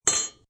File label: Mtls Spn Drp, Metal spoon drops and clanks.; Objects